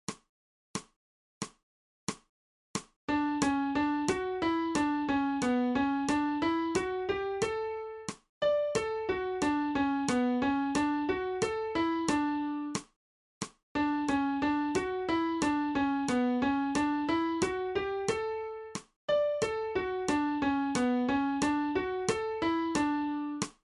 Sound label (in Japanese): 新フレットレス